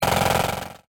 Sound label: Drill